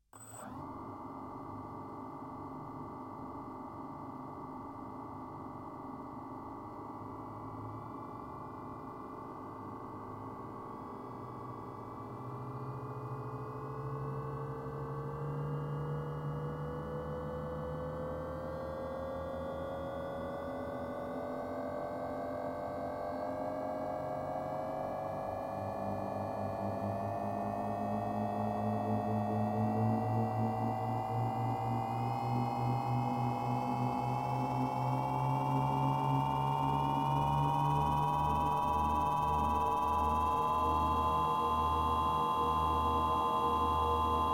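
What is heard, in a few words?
sounds of quadcopter drone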